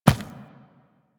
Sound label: Infested Medium Run Default, Footsteps; Warframe